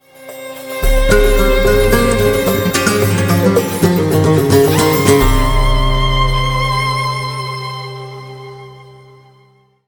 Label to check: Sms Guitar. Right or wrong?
Right